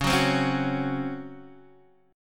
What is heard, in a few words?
C sharp Major 7th Suspended 4th, Guitar, Half Step Down; chord